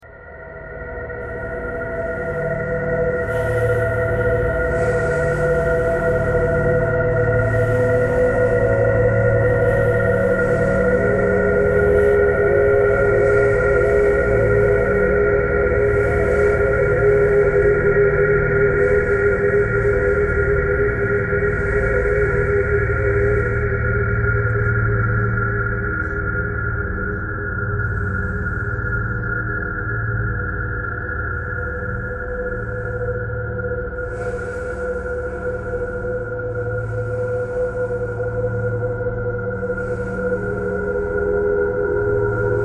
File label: traffic k core birds thread all; bowedmetal